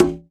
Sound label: conga open; Sonic Foundry (Sony Creative Software); Ugly Remnants VOL-2; Beatstuff